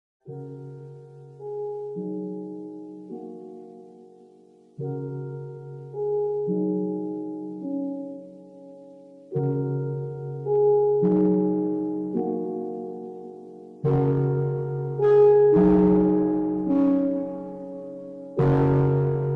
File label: earrape reverb